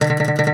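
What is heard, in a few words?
OUD tremolo, Plucked Strings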